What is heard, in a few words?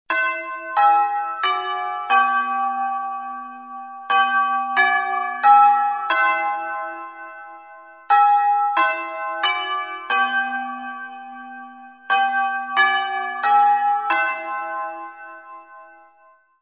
chime